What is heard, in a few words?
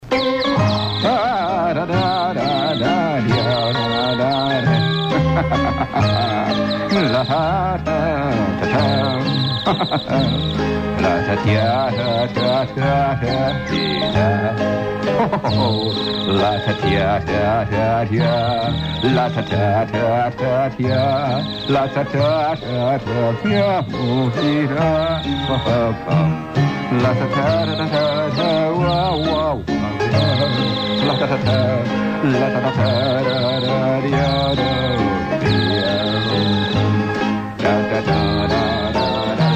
piano